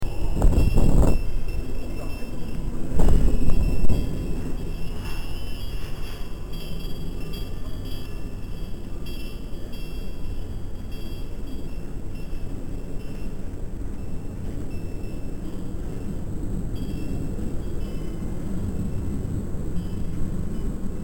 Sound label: Haeinsa S Korea Stupa Windchime